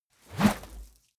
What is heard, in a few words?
whoosh dirty rustle; swooshes-swishes